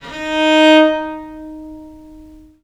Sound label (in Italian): D#3, Historical Instruments, VIOLE MUT; USB Soundscan vol.23; AKAI